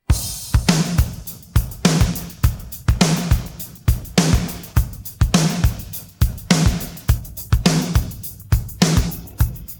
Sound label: pattern, drums